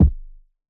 kick; bespoke - Floral v.1; drum oneshots

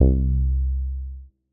bass; bespoke - Floral v.1

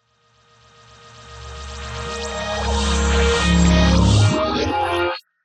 melodic fx digital doodad Ab Maj; base pack

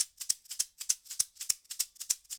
shaker swung 100 bpm; percussion loops; bespoke - Floral v.1

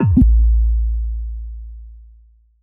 Bass; 7rystan's 700+ FREE COLOSSAL EDM SAMPLE PACK

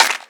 Clap

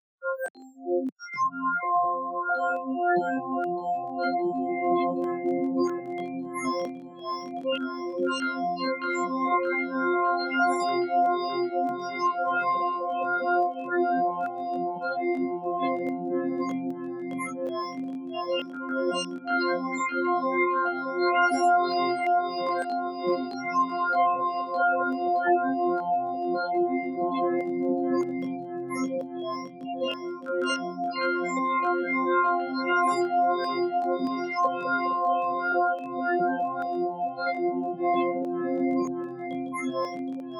pad spectral spores f, synths pads; base pack

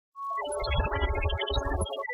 melodic fx A; base pack

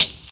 Hi-hat; 7rystan's 600+ FREE EDM SAMPLES & DRUMS; Percussion

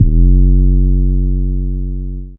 Trap bass, EDM

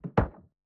percussion; bespoke - Floral v.1

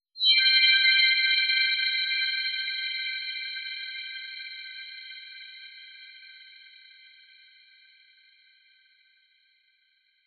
bg shimmer C Maj; fx; base pack

